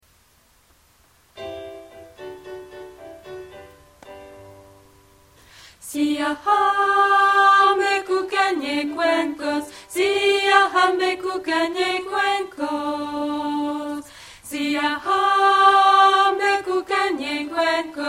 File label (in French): alto VOIX; siyahamba